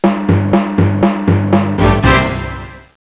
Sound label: orchestra